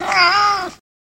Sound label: Cat Growl Meow